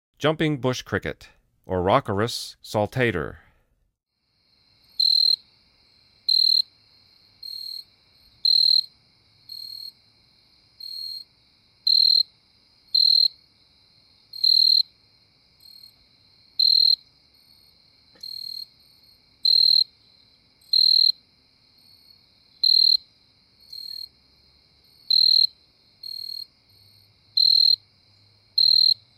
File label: Jumping Field Cricket, Insects; The Songs of Insects